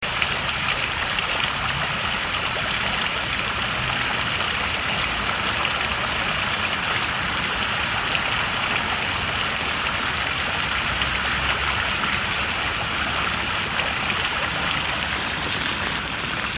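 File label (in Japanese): hunsui, fountain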